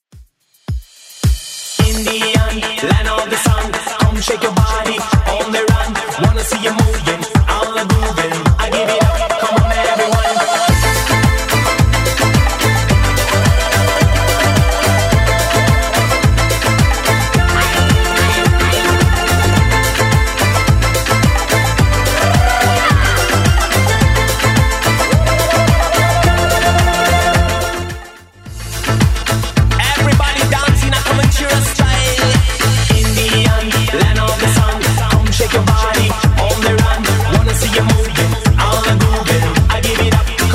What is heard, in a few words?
90s Redrum 108 bpm CLEAN